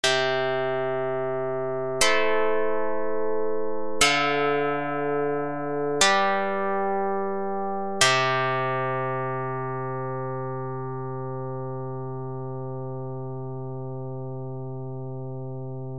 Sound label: chord prog pluck